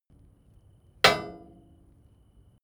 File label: metal impact short; hits explosionen; metall